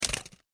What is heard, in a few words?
paper thrown bin; Paper ( Screwed / Scrunched Up ) Thrown Into Small Bin / Trash Can; foley; Materials; McKinney Sound